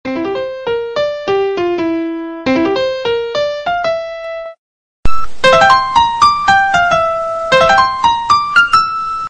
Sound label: lovely soft music mobile tone ringtone